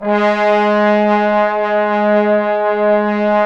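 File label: BRASS TROMBONES F3; Roland LA; ORCHESTRA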